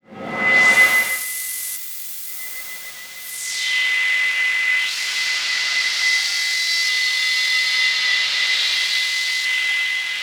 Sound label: Filtered Noise, radio signals